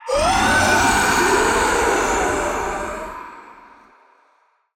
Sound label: death; finelBoss